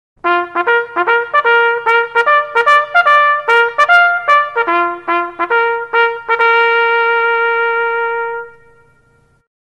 Assembly Bugle Horn Sounds Car Horn

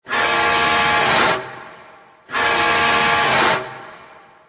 Horn Sounds Car Horn; Jeepers Creepers

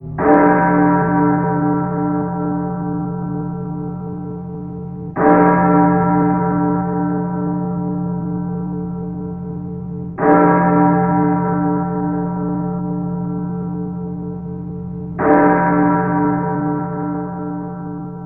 Bell Tolling Halloween Sounds Car Horn

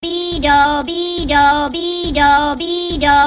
Beedo Sounds Car Horn

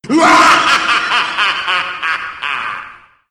Evil Laughing Man Halloween Sounds Car Horn

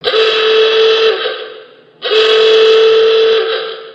Klaxon Horn Sounds Car Horn

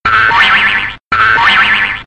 Cartoon Pluck Boing Sounds Car Horn

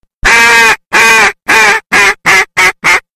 Duck Call High Ball Greeting Sounds Car Horn

